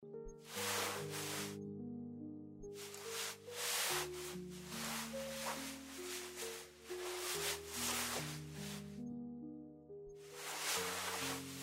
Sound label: Human Foley; human sfx; human sfx pack